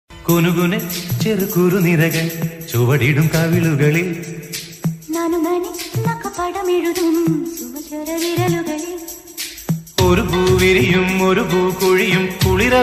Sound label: malayalam song; yodha movie